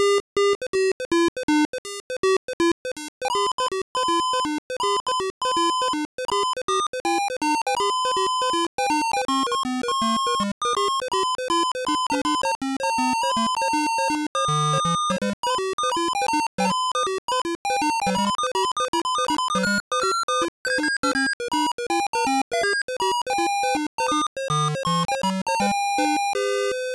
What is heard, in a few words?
Jingle Bells; A Computer Christmas; IBM PCjr